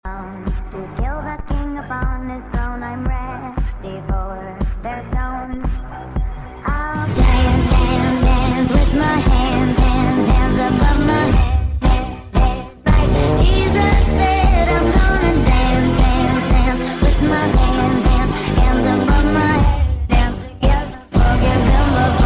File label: dance ringtone; wednesday dance